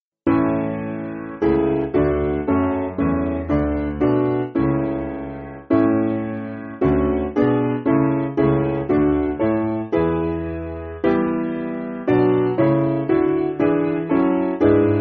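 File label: Piano, Music Backing Files for Churches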